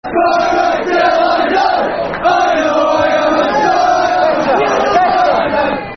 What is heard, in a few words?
Football Chant (Soccer Songs); Spireites Till I Die; Chesterfield Cfc